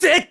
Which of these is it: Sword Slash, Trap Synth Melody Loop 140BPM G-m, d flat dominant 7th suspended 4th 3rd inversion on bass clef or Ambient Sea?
Sword Slash